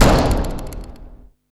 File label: CYBERGUN R, Hard & Loud Techno